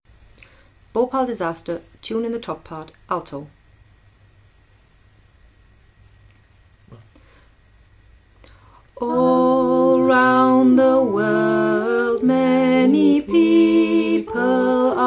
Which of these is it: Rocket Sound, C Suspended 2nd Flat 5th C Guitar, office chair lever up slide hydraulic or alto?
alto